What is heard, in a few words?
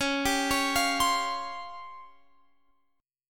Db Major 7th Flat 5th, Guitar, Open F; DbM7b5; arpeggiated